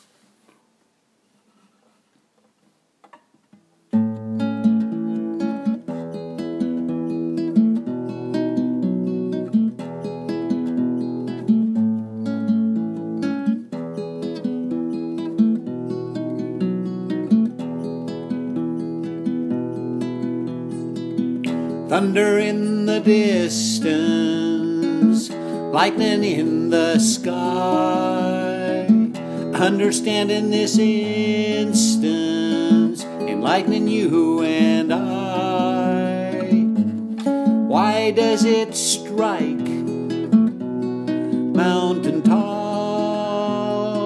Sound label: Thunder and Lightning